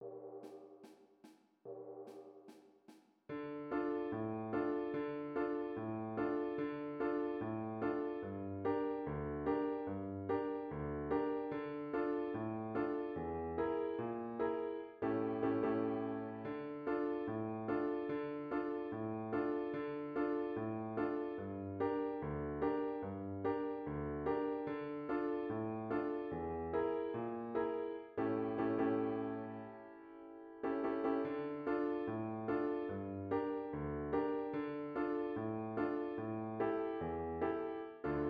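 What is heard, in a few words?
Buba maro accompaniment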